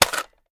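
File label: weapon foley assault rifle reload empty dmr mag out; weapons; gmod; viper; scharlie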